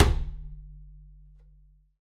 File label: perc, pizz; meatbass